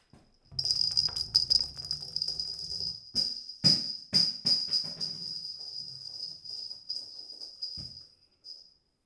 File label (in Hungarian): gurítás jobbról földre leesik, csörgőlabda; Perkupa; játék asztalon gurítás